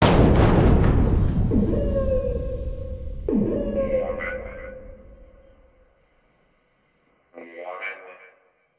camshake; spaceship

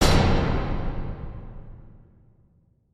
piston arrive

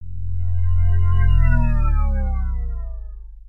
Modular Rebuild Drone Power

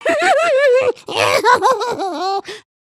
Escaping Yell

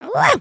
excited, emotes

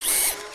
Overbuild Fidget; emotes

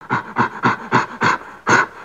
Gorilla Breathe

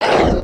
hit, creatures organic

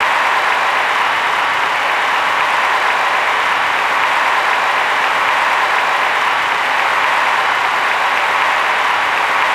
Large Audience

